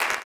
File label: CLAP, blues & soul; ILIO; Vocal Planet